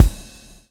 swing

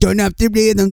REGGAE, Vocal Planet World Voices; ILIO; Vocal Planet VOL-5

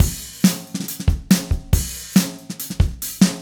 Acoustic Kit, Drums & Percussion

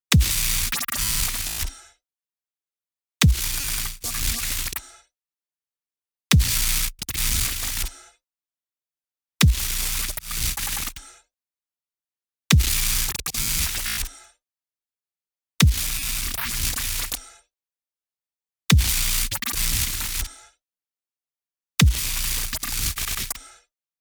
blipbop

